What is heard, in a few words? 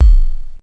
Kick, Ensoniq ASR-X Pro